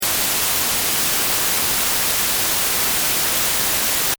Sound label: white noise short